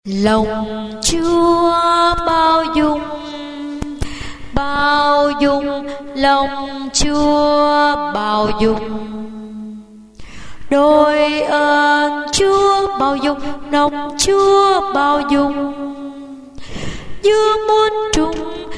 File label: basso